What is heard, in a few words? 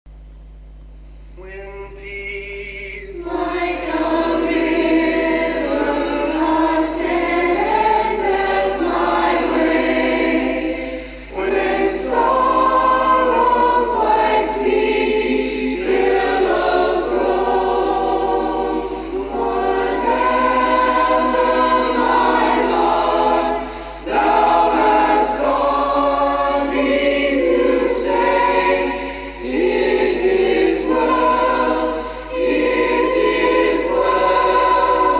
music english